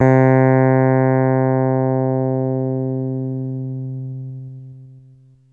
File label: Techno leads, hits and riffs